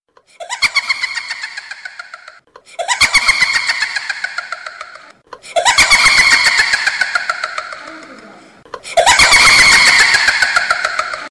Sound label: Evil Laugh